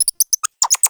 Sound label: Ext Insect Close, Ambience